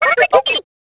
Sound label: droid vox